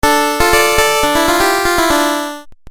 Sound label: Game Music Phrase; Video Game Sound Effects